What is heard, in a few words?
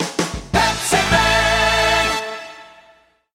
jingle; pepsiman